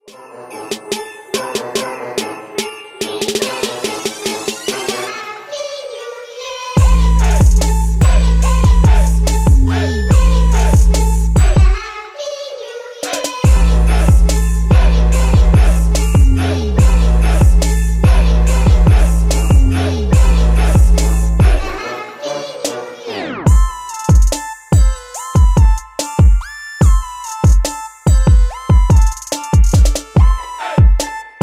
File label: merry christmas trap remix